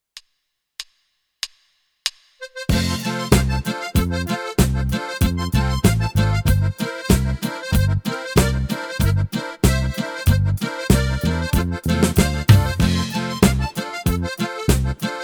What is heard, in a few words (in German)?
Boarischer mit Melodie